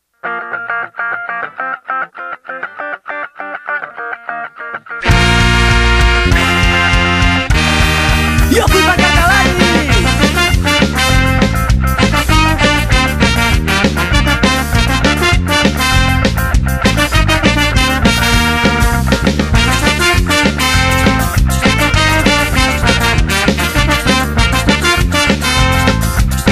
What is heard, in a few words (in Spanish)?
musica